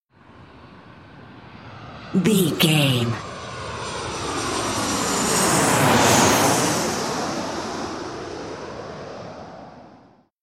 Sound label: airplane passby